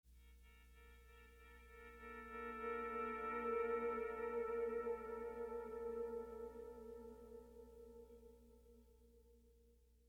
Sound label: Pleasant Guitar